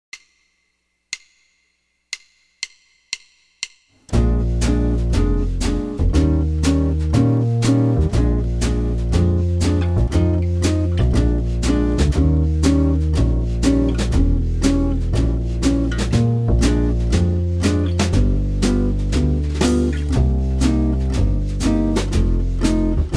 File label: Jazz Blues Bb Major Root 6, jazz guitar